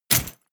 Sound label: step armor halfplate